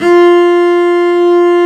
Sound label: cello, Arco Solo; String Master Series